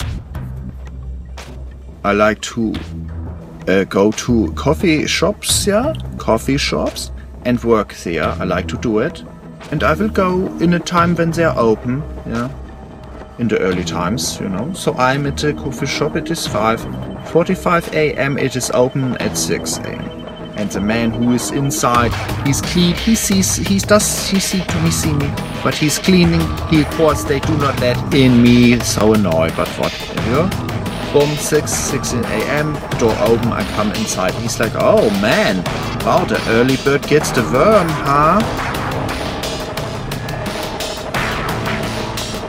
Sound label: dub